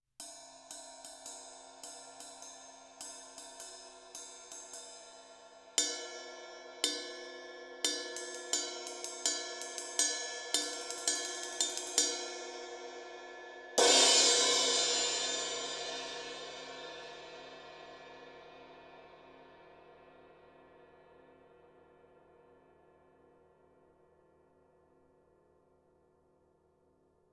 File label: Cymb Ride; Far Edge